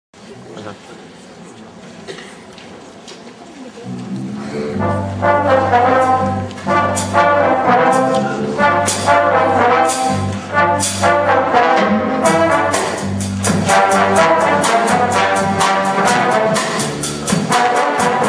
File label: trombone